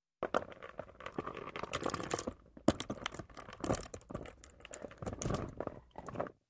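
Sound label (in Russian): в кране кончилась вода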